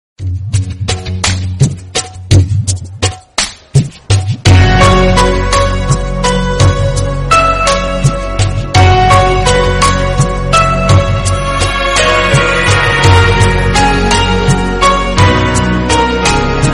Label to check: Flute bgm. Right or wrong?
Right